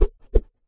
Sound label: kung fu staff, swings; UNUSUAL KITS and FX